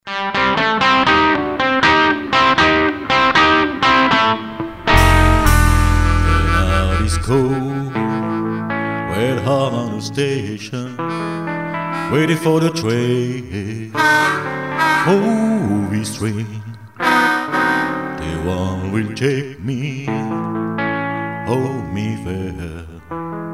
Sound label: WHISTLING TRAIN; rockabilly